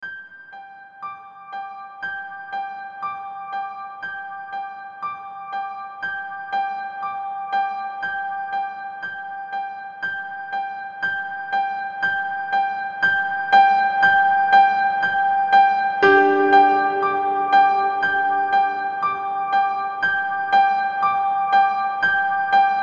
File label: melody lone piano